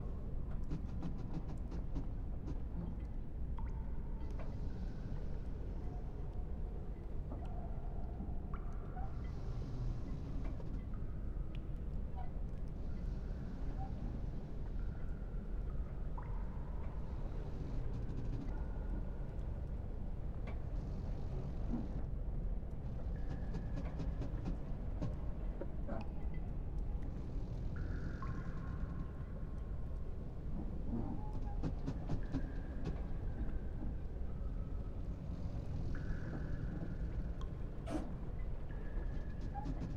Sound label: Sea Treasure Room